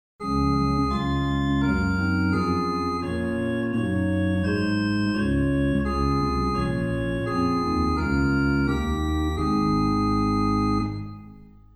Rohr 8, Ged 8 + Rohr 4 + Nas 3 + Ter, Sub 16 + Oct 8, organ stops; Ped; Reinhardtsgrimma